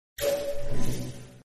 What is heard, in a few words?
loading